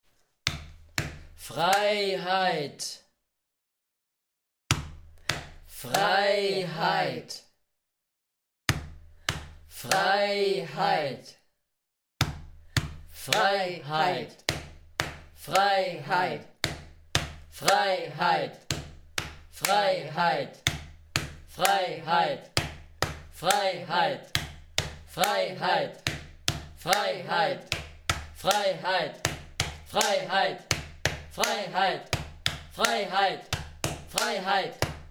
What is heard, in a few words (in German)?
BOUM BOUM Freiheit Skandierung